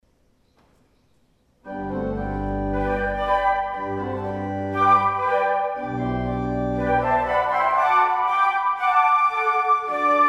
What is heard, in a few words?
Uccelli, Floeten